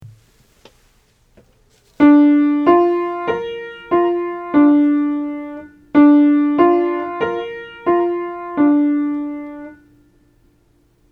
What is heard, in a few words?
Triads in Inversion Aural Training exercise